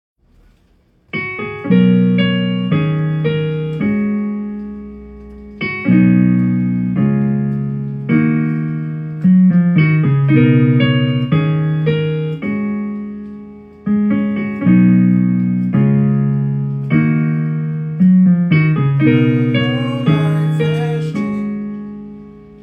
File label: me singing differences